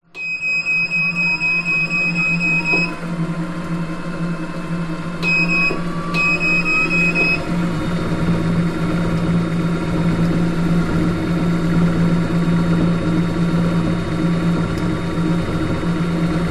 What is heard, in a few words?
gym vibration machine on speed up to highest setting off